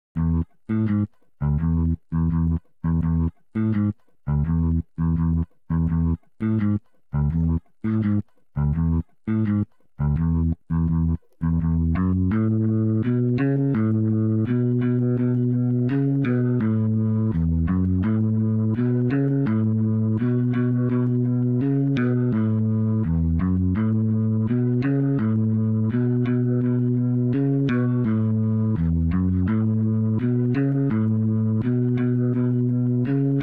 bass; robot 64 lore

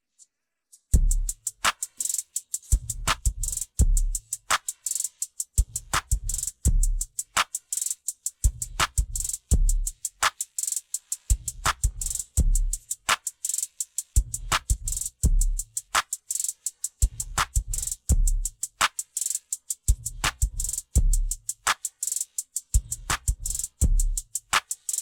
drums; robot 64 lore

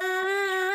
stage vox

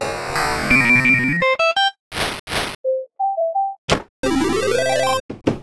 drip sfx

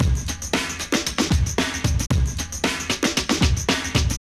forklift zone perc

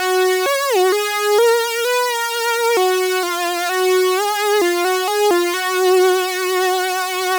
serum unison detune saw LFO modulation vocode

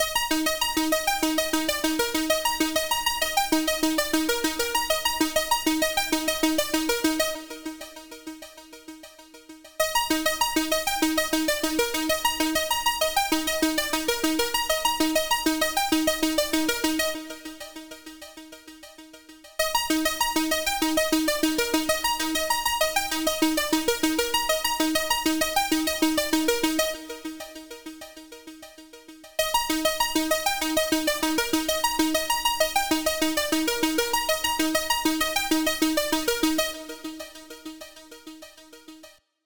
serum patch; peroxide peak

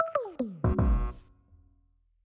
upload fail

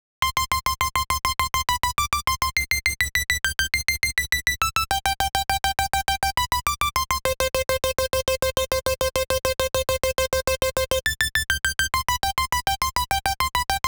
Serum Synth Stabs

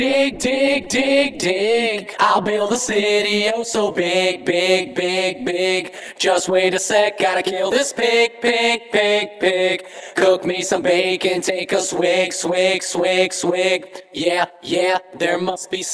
TNT Vocals

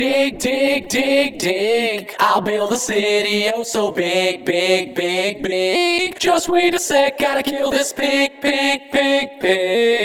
Vocals

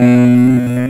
loop

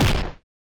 punch; roblox

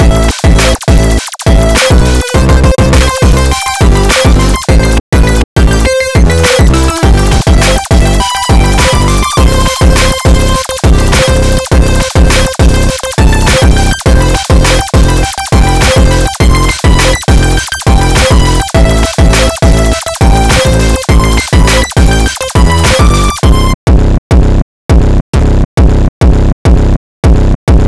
beat

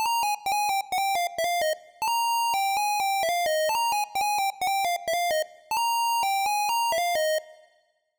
lead

